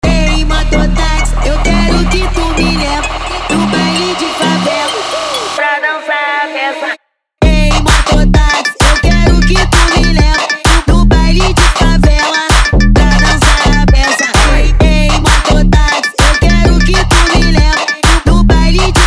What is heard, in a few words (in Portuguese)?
eletro funk